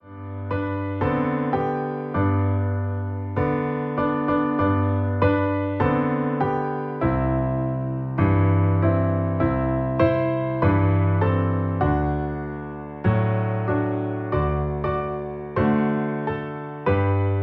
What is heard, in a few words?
Jiggle Bells piano